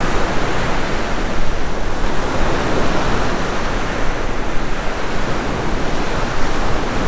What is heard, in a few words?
ocean loop